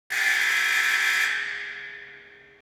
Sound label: BUZZER